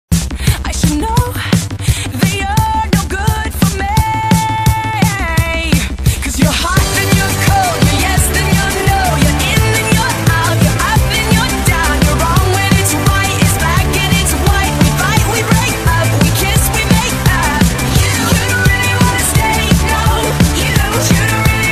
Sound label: speedup english ringtone